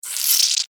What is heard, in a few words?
Alien Insect Vocal, Scifi, Alien, Insect, Vocalization, Creature, Small, Science, Fiction; Blast Wave